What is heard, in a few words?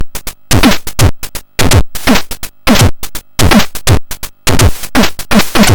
Drumz; Excellence In Art